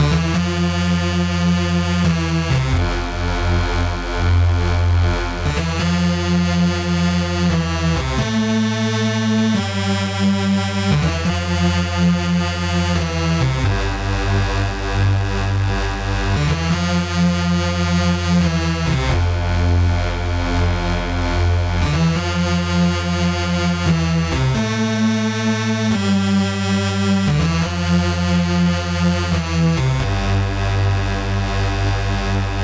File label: strings; terminator; synth loops